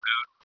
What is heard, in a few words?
boot Tone